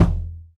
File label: Mex Kick; Roland BK-5